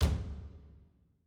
drums

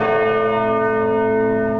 bell

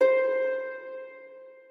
harp

